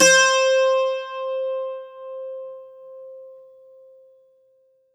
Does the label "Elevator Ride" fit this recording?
No